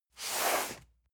cardboard box medium slide; objekte

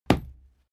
fight impact sweetener cloth; nahkampf; soundpool

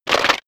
stone gravel clatter; industrie-wirtschaft; baugewerbe

